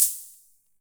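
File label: Olson Cym B, cymbal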